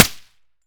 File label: fire sup, m16; Urban-Coalition; Decay; arccw ud